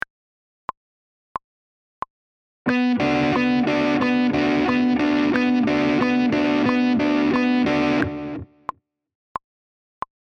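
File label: with click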